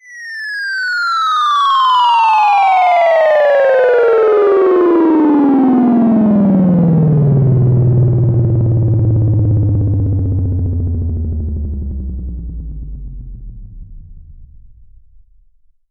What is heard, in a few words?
Sweeps Down